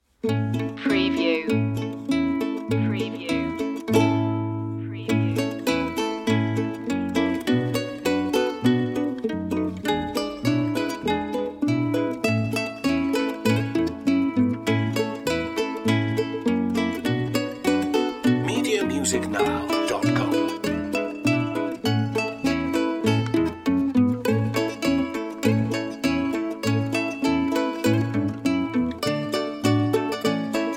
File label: jingle bells ukulele